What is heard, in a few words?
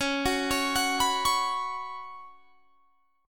Db 7th Suspended 4th Db down; arpeggiated